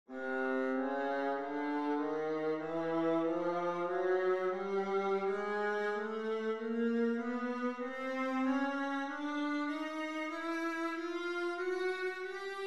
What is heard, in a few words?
viola chromatic scale; scales